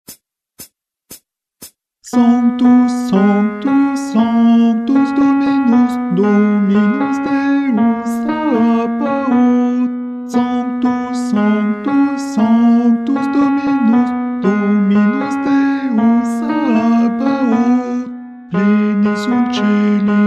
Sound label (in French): Guide chant Ténor; Messe de St Paul Sanctus